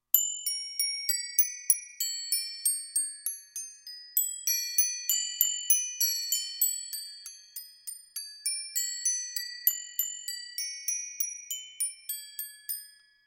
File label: source chimes